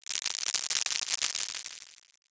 applause

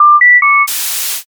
modem

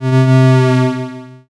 creak

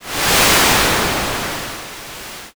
wind

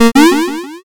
picoyes

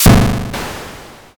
thunder magic

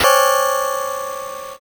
sting

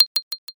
hyoshigi

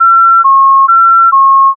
ambulance

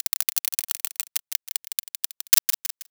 roulette

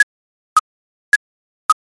ticktock

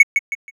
cursor

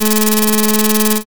slot spin

